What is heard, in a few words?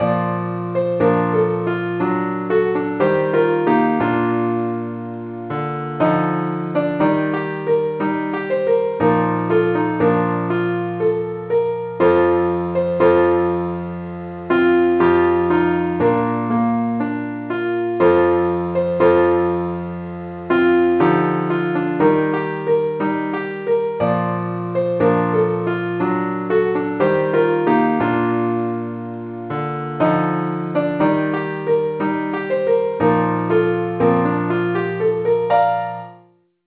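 keyboard Only key E flat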